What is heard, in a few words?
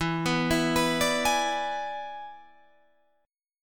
E 7th E down Guitar Drop D